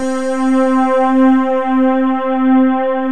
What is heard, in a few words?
DIGI STRG